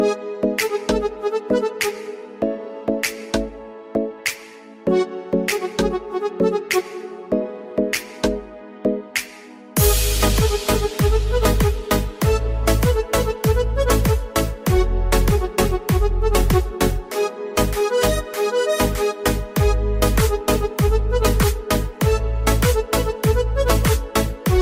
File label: Original Mix Ringtone